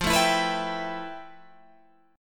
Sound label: F Major Sharp 11th, Guitar, Open G